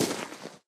flint step; Golden Crayon Sounds

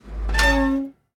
door open; Golden Crayon Sounds

